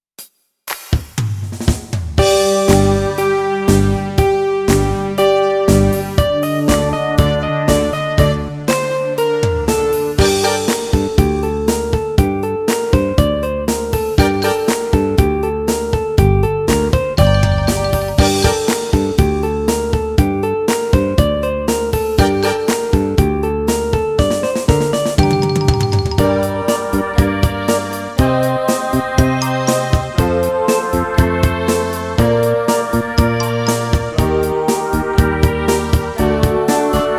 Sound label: Fire Dance F, Backing